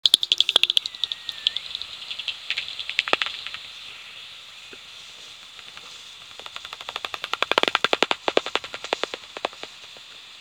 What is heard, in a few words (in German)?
Fledermaus